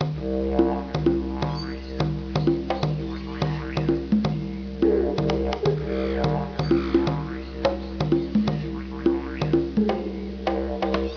Unused Music; PuttRace

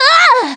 Damage Fly; Ness

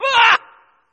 Death; Tarma

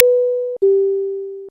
Controller Mouse Test Unused Jingle; SNES Burn-in Test Cart

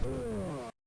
Death

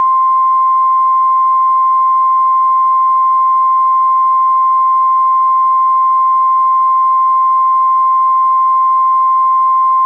unused jingle; Controller Test Cartridge

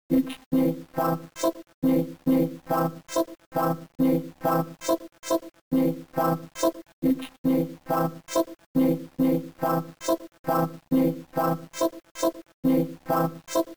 bgm bomb 8 bar; WarioWare Inc

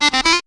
Game Over; Prato Fiorito

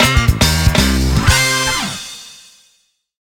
Unused Song

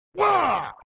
Male Attack

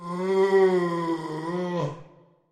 Voice Hurt; BatIM; Searchers

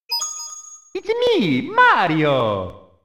Intro Jingle; super Mario 64

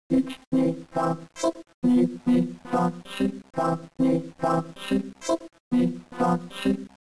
BGM BOMB 4bar; WarioWare Inc

